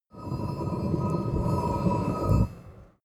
metal scrape

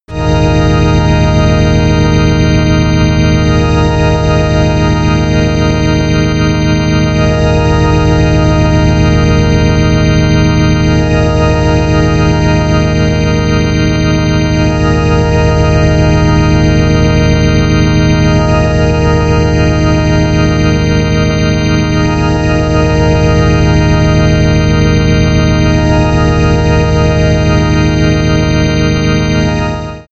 organ air; flaechen

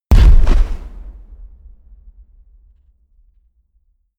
explosion fuel; explosionen; comedy-cartoon; soundpool

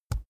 keyboard laptop enter push soft; kommunikation; computer

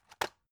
drug package drop deep; arzt

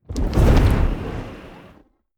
organic curtain, whooshes; whooshes rises

